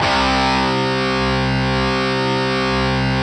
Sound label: GUITAR, POWER GT